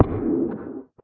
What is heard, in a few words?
guardian hit; minecraft; mob